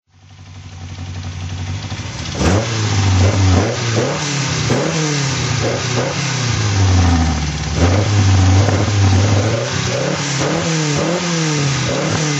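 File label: lancia fulvia fanalone throttle, Engine sound; Lancia Fulvia HF 1.6 Fanalone, 1969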